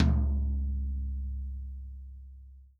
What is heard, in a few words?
REAL DRUMS POP KIT; TOM; Best Service ProSamples vol.43 - Real Drum Kits